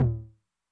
Med Tune Med Decay, 909mod MID TOM; classic machines; MT - MID TOM

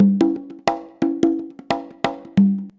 CONGA, Modern Percussion Loops

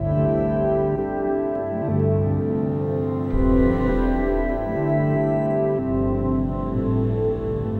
Acoustic Drone, Long Loops

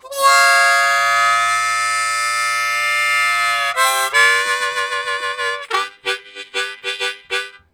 Train, Harmonica Riffs; Zero G

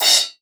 brush; Northstar - New Gold VOL-2; 30S BRUSH

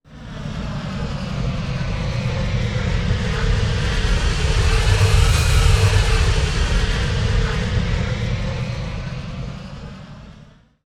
Fly Over, Flyers; Zero-G Seismic Frequencies

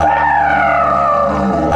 EF 140, DIDGE RIFFS

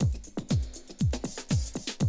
120, Classic Drum Loops

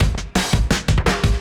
Beat Style E 170, breaks; 170bpm

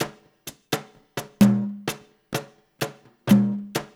LOOP, DANZON, Percussion; InVision Interactive; Percussion Slam

